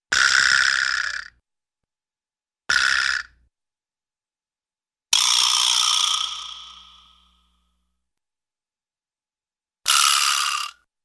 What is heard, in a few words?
Rattley Wooden Ratchet-like Spin, cartoon; Hollywood Edge; Cartoon Trax